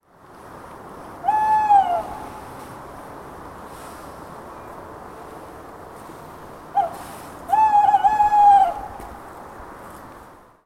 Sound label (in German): waldkauz